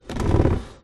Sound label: household garden table plastic slide creak